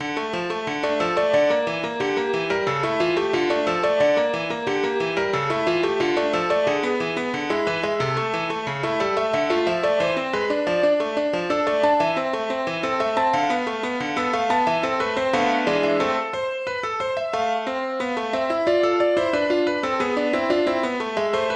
Sonatina in D Minor Mvt. 1

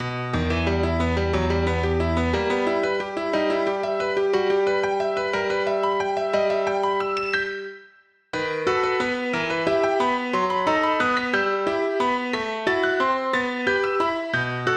Waltz in E minor

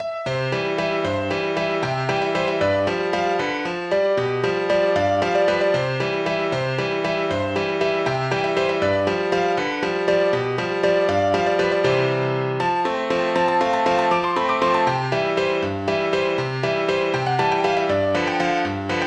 Cuckoo Waltz

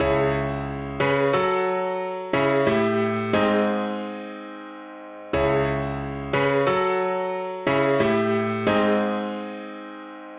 Nocturne in C-sharp Minor

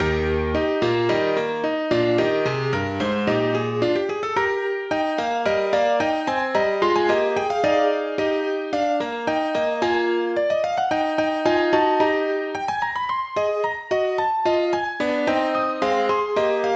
Sonata in B Flat Major K570 Mvt.2

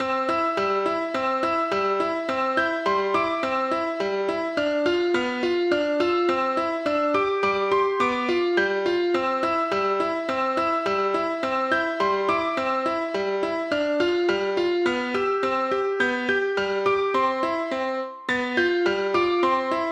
Jingle Bells

